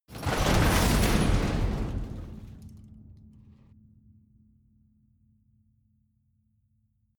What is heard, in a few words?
metal long pieces, industrie wirtschaft metall